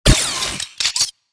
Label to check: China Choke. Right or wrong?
Wrong